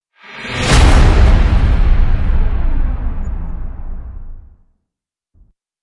Swoosh Hit Swell

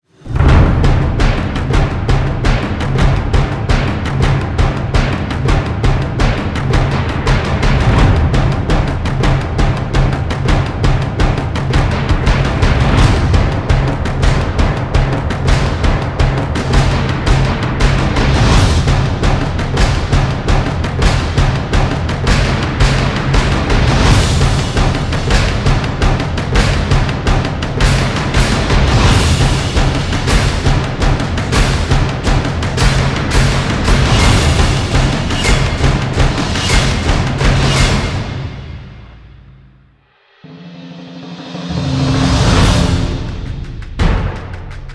Drums Only Version

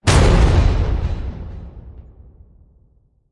Hit Epic; Ninja Tracks

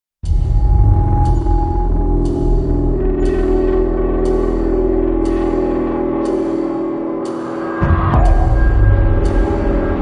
Damaged; Ninja Tracks

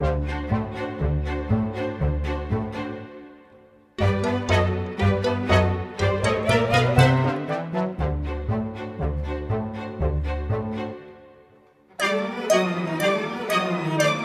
Nutty Ambient

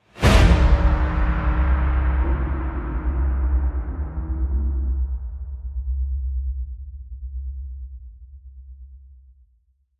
Monastery Whoosh; Gothic Storm Music

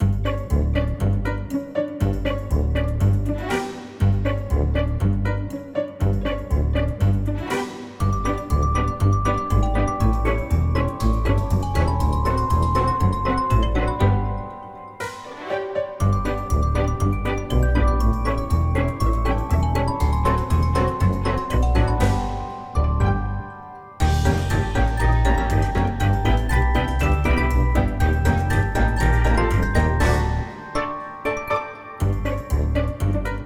Clowns Ambient

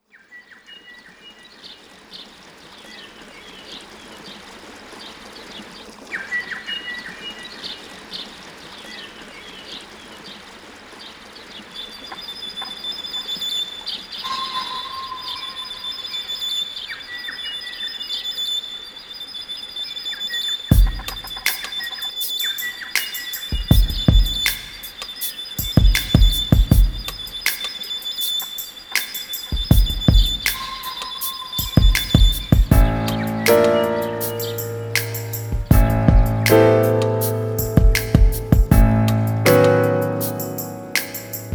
Birdman (Hungarian Folk Song)

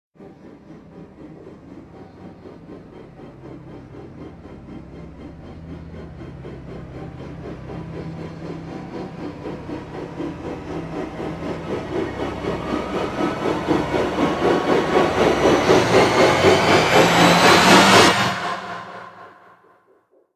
Rise Timebomb; Brand X Music; Brand X Music Catalogue - Editor's Essentials

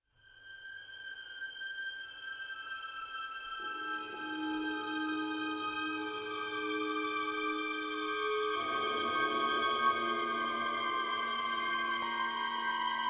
Downfall Ambient

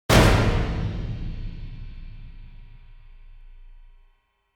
Chase Hit; Gothic Storm Music

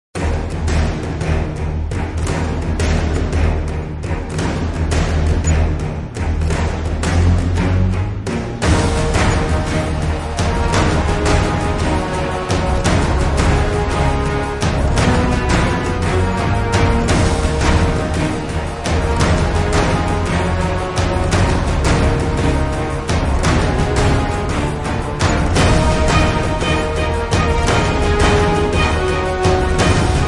No Vocals

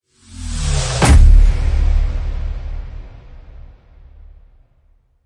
Inbound Hit Downer

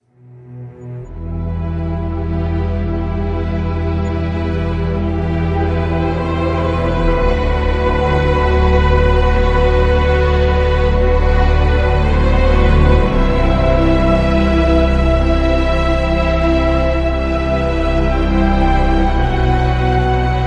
Profound Ambient

